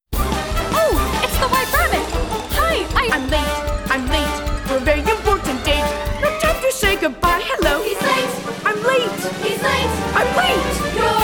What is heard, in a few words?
Reprise Guided Vocal